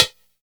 tamed pedal hi hat single hit g sharp key, hat sound sample tuned to the G# note